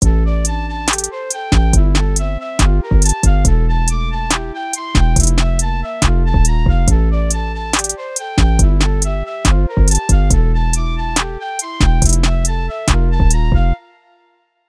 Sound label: Flute